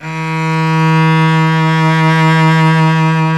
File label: Synclavier Strings; ILIO